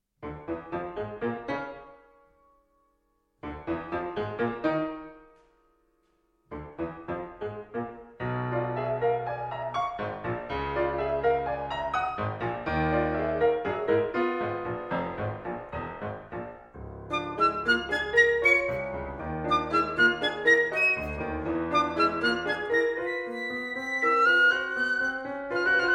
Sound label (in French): Sonate pour piccolo et piano